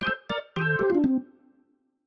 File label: 60s Organ, notification; Samsung Galaxy S26 Ultra; Retro